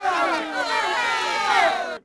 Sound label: CROWD NEG LOW; Cars; XBOX